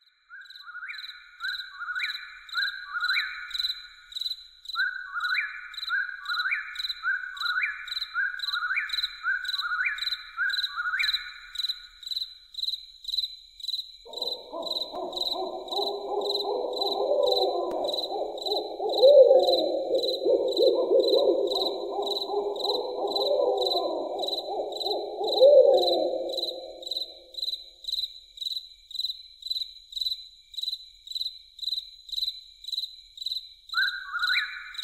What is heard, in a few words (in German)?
Entspannungsmusik für Babys